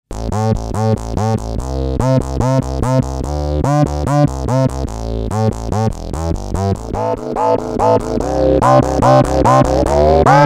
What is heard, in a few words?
Ring Mods, Korg 700S